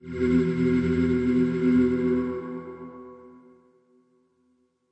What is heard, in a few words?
minor, glitches growls words and tones; Ze Franks Pain Pack